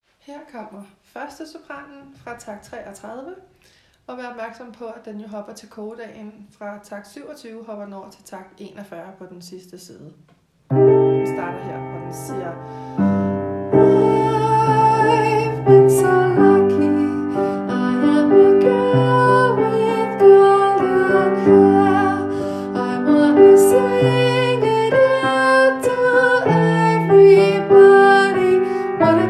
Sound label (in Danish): sopran; fra takt 33